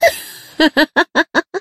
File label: Chuckle